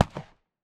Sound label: draco dist; weapons